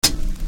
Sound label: Hi Hat; One Shots; Sonic Foundry (Sony Creative Software); Infinite Soul VOL-1